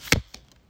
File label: throw down, cards; aliens